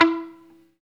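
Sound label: guitar mute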